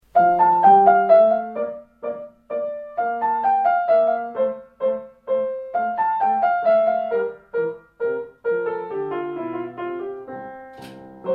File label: Menuet in F